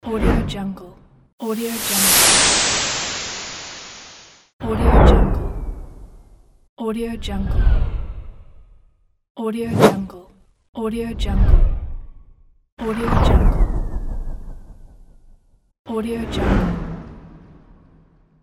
a whoosh; 3dhome

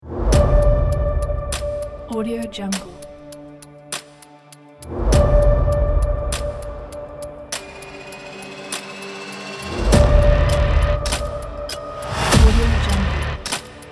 aggressive trailer countdown; soundridemusic

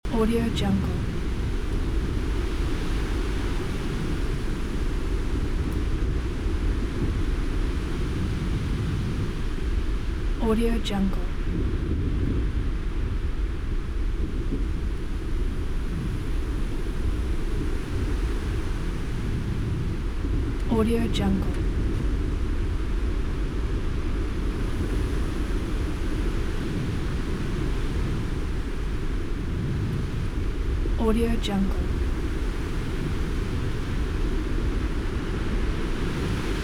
hurricane wind near woods loop

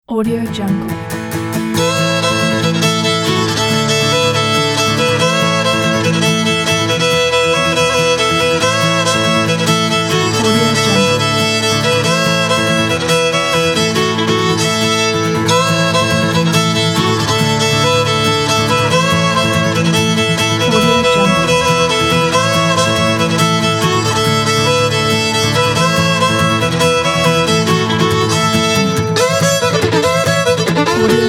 country fiddle; goodbmusic

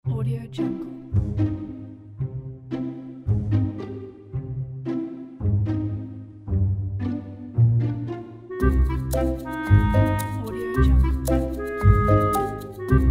silly sneaky comedy; vaanfomm

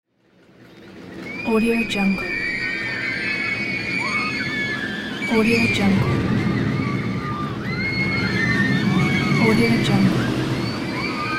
roller coaster scream; wistansound

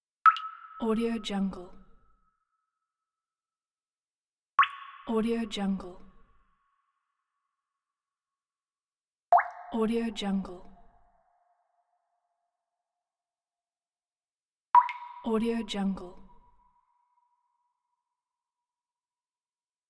water drop; moonaudioproduction

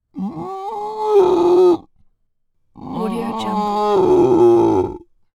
subterranean beast humanoid or creature screams

